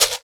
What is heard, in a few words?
Fx Vox; Equipped Music; Loungin House